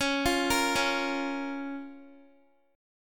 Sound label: C sharp 6th C sharp down Guitar; C#6; arpeggiated; tuning=4E,4A,5D,5G,5C,6F